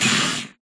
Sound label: hurt, phantom; mob; Minecraft